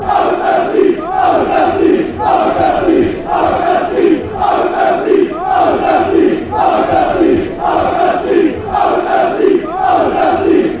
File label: away victory, fanchants